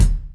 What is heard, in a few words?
old school studio bass drum sound d sharp key; Royality free kick single shot tuned to the D# note